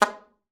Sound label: G#3, alto sax; Xsample VOL-2